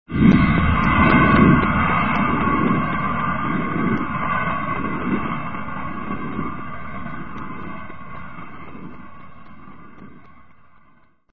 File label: space storm